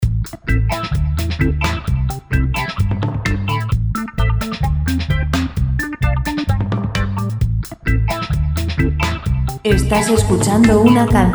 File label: 130 pop; pop musica libre